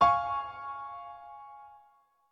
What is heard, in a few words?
piano low